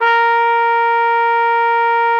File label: A#4, TRUMPET; Best Service ProSamples vol.42 - Session Instruments; PS-42 Session Instruments